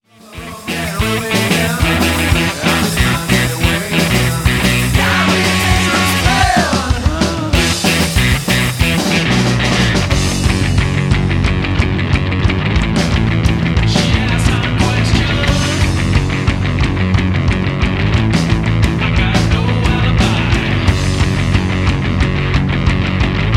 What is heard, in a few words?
Wrecked Chords